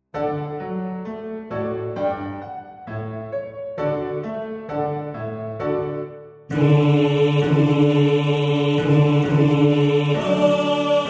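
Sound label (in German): Tenor 1, Männerchor; Christenglaube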